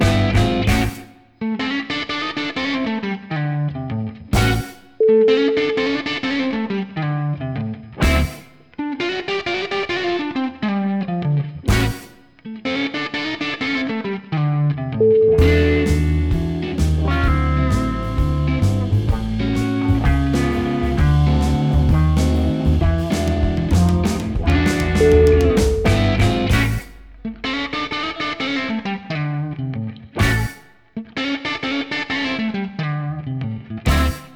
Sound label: straight blues